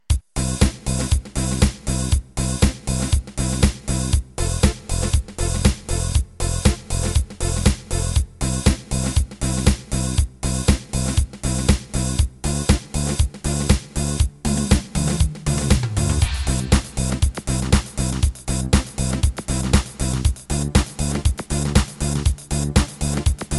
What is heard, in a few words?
House